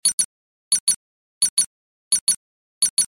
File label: Data download beeps beeping